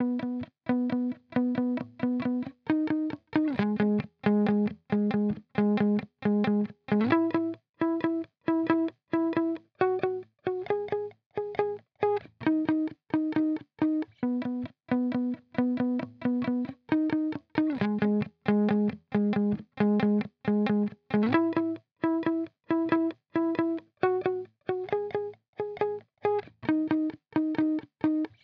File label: 135 BPM G# Min Backing Guitar; Loop Stems; Cymatics; Reasons